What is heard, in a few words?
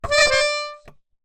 Ornament, Melodica